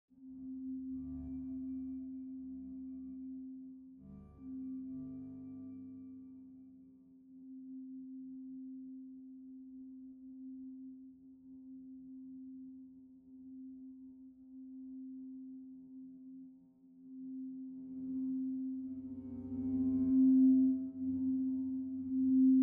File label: Golden Hour Soundscape